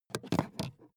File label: charger pick up